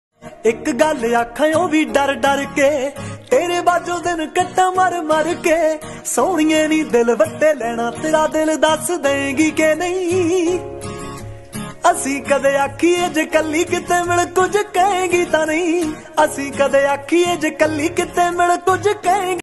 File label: Punjabi Tune Ringtone